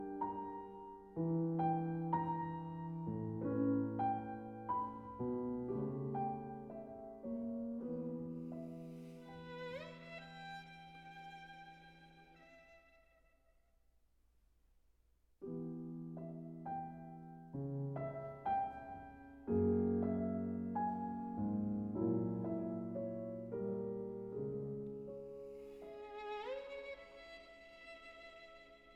Violin Sonata in A Major Ben moderato Recitative Fantasia; FWV 8